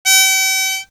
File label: Wind